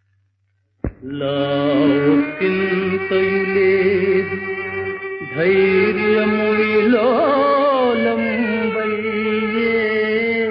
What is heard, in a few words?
Padyam; Santhanam